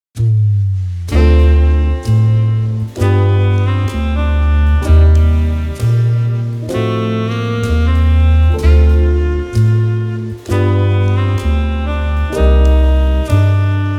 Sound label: Jazz; BGM